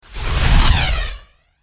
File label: tsar scorpion